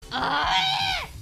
screech

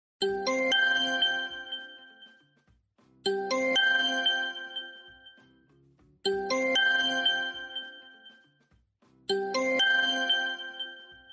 ubereats order sound

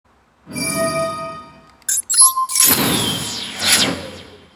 spell activation

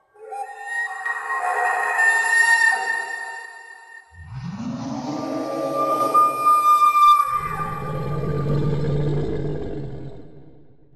wendigo aggro

